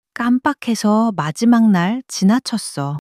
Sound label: struggle